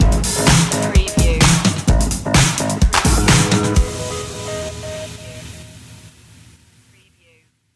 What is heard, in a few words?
robo-disco stinger